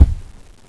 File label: player step